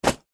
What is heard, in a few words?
office pencil case full drop